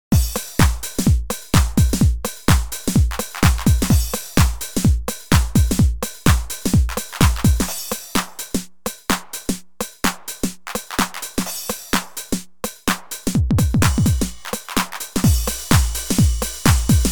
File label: shuffle, 909